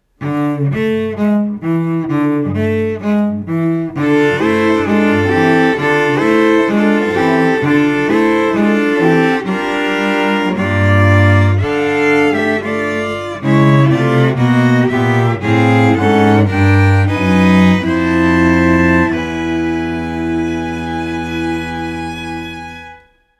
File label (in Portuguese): Vinheta Vc; Calebe